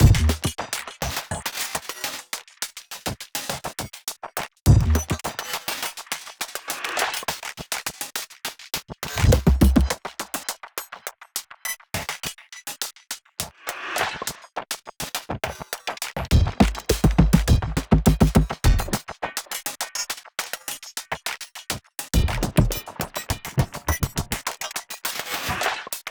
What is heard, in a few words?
music drums Fight; music ftue drumsFight 05